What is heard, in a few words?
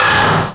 metal frame scrape; Portal 2